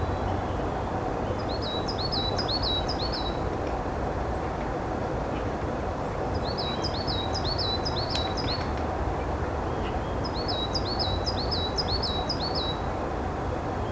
Parus ater song; Irk botsad usilenny

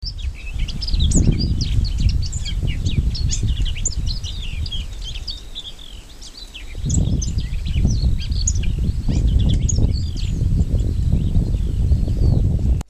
S curruca song subsong

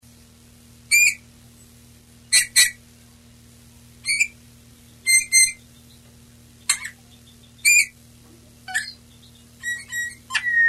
Male Pyrrhula pyrrhula pyrrhula

